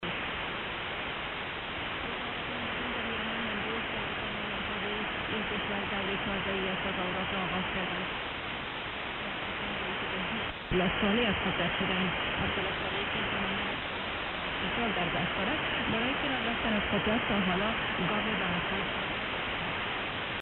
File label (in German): Kurzwelle 15m Free Afghanistan 19010khz